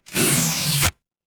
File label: paper fabric tear